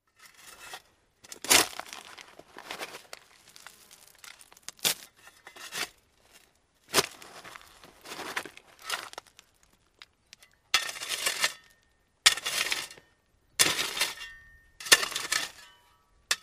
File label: Shovel Dig Dirt Shove